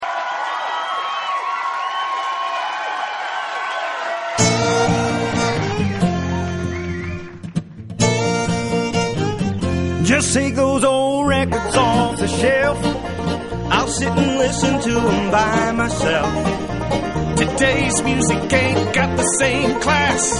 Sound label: Old Time Bluegrass